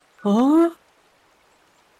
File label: huhhh